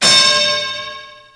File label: Sfx American football